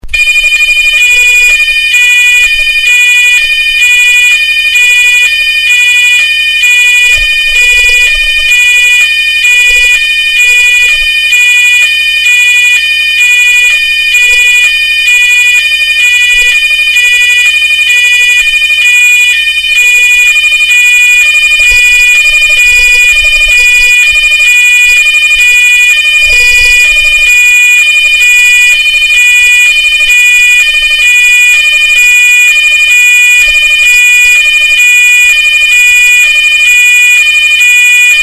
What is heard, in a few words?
cerberus pyrotronics mtl s15 s hi lo, alarm